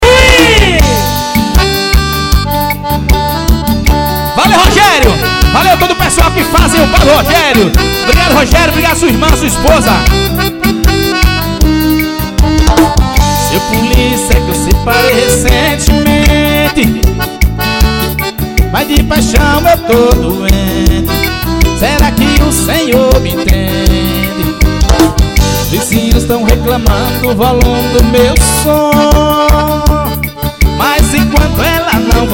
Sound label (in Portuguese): ao vivo no bar; promocional; bar do rogerio